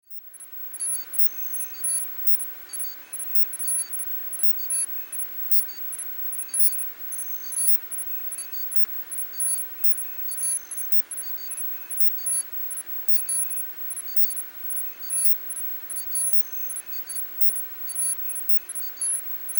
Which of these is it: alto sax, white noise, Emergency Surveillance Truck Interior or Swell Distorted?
Emergency Surveillance Truck Interior